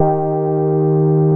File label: DREITON E3, synths; SE-1